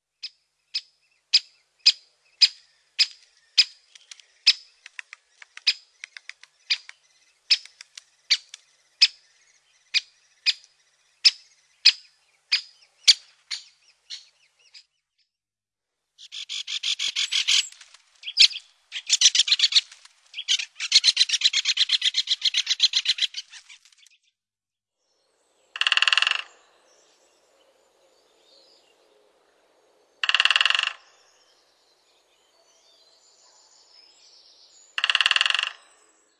Pic epeiche, oiseaux; birds

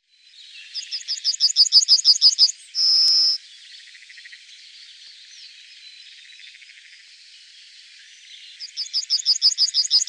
Bruant jaune, birds

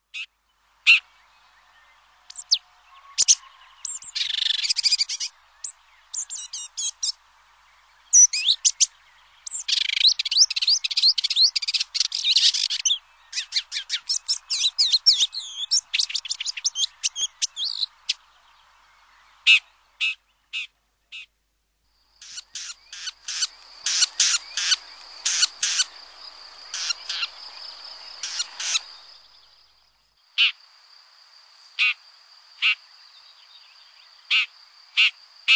Pie-grieche ecorcheur, oiseaux; birds

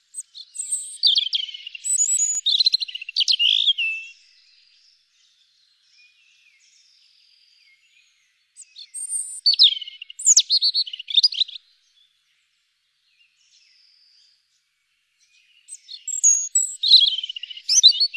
Rougegorge familier, oiseaux; birds

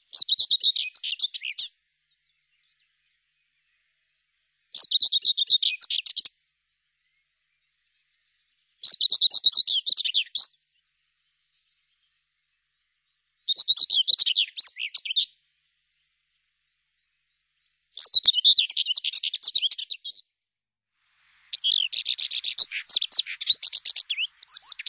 Fauvette grisette, birds

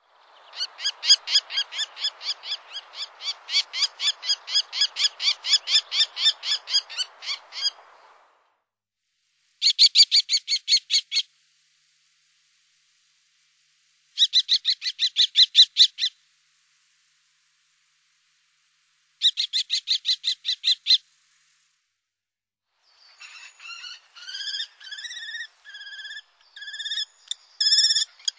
Faucon crecerelle, birds

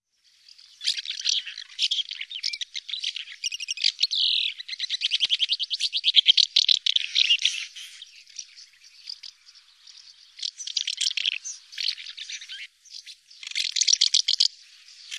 Hirondelle de fenetre, oiseaux; birds

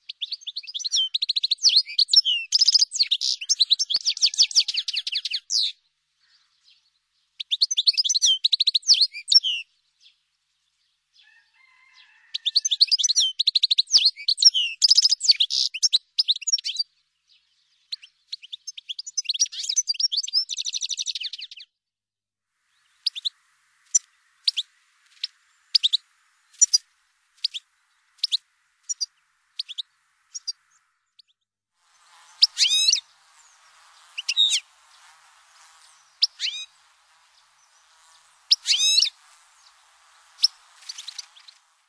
Chardonneret elegant, birds

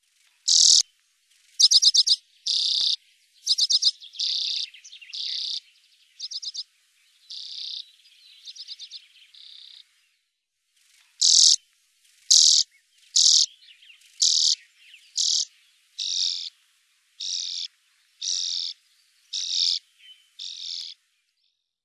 Sizerin flamme, birds

